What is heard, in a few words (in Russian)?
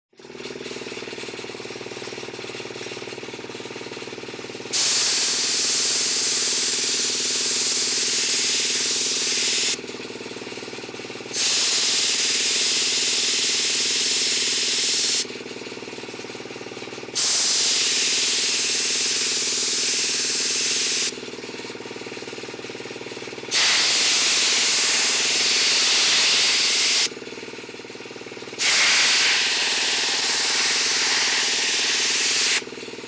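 Краскопульт распылитель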